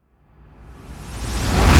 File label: Impact Layered Reversed